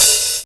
KGT1, Korg-T1, Drums

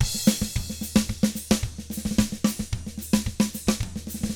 SMBA, 110 SAMBA, Drums

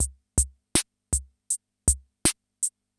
CR78; Best Service; XX Large Most Wanted

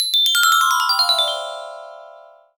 Glock; Orch Perc and Stings; cartoon; musicradar; cartoon caper

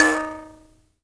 HIBZ KL D#4, HIBZ KALI; Heart of Africa

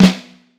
FLAM, Snare Drum; 4X15; Q Up Arts; Johnny.C's Vintage Snare Drum

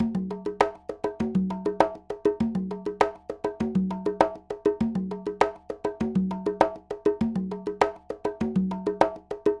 Conga, Latin Percussion

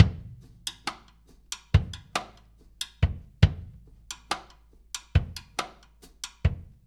Earth Loop, Drum Tools, Laid Back

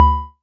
Multi-Bell

